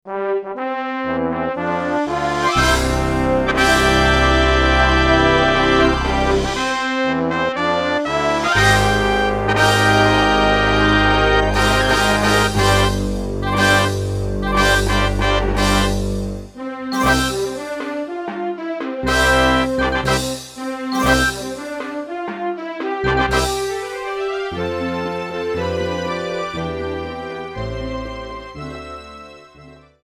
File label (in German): Orchester